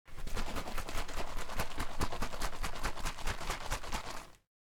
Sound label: shaking can; bathroom